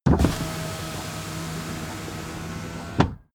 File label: car window front open full